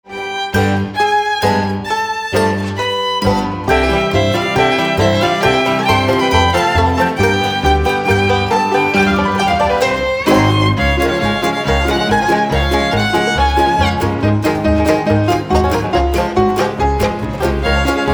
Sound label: Bows Exit Music